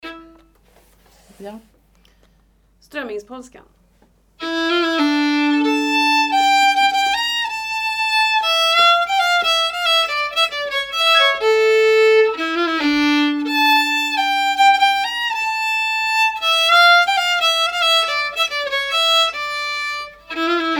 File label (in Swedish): Strömmingspolskan